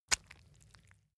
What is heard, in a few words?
leather impact mud medium